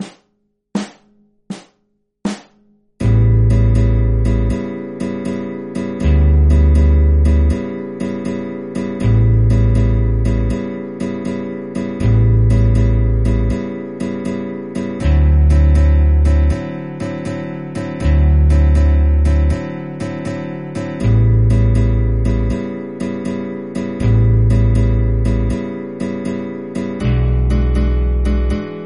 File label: chord progressions 12-bar blues in A